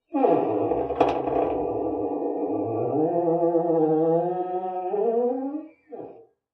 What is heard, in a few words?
screech, squeaks